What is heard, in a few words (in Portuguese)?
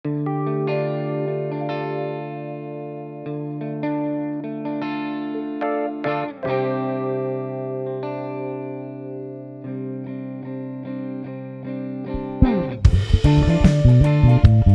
gaita reggae